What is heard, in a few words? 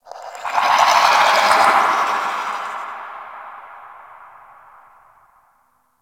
Misc One Off, Ambience; Warframe; Tenno